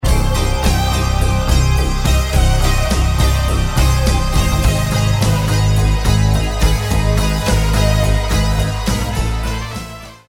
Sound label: Weird Circus trumpet